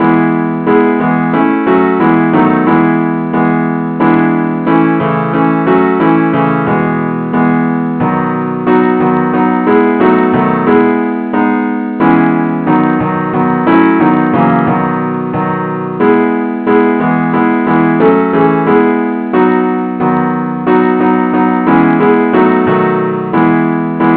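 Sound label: advance accompaniment key e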